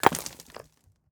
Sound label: stone fail